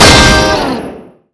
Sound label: guitar fire